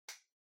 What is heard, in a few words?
Pendant Light Far Switch